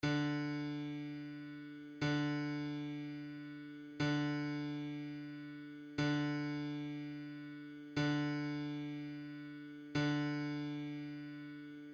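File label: d string, guitar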